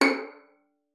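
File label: violin pizzicato C#5; Xsample VOL-5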